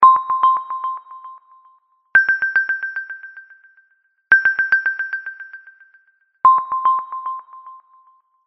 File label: orbit ringtone